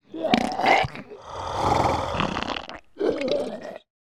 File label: Zombie Disgusting Sounds, Monster Sounds; The Decline Of Warriors; Monster Sounds Volume II